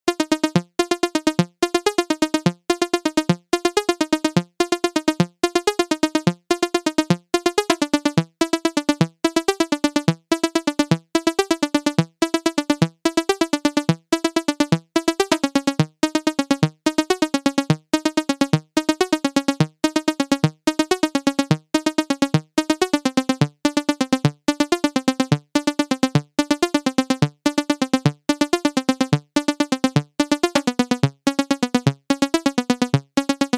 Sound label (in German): Der ETM Drops